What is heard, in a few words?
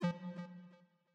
Speech Misrecognition, sound scheme; Longhorn 7ista Sound Scheme by David Mounder